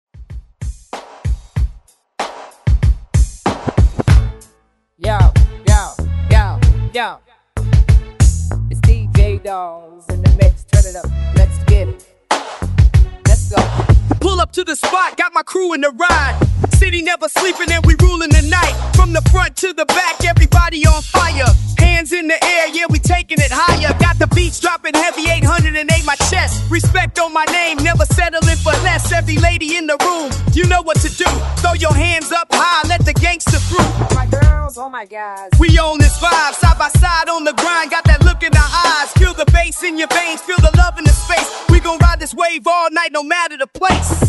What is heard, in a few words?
90s Re Drum Remix 95 Bpm; DJDOLLS